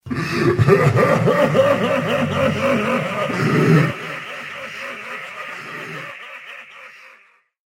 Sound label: slow laugh; rogan